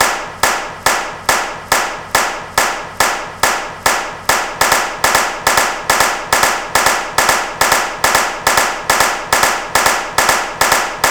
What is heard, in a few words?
swing